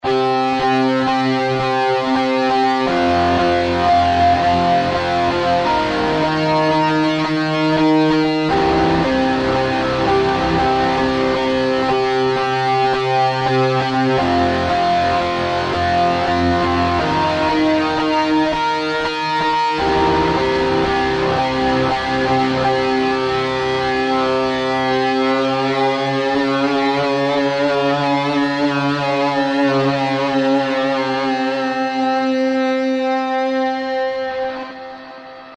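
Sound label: guitar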